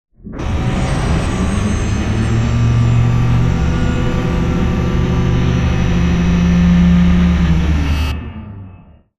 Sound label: Giant Floor Panel Exit On Track Servo; Boomtown; Sideways Town; Titanfall 2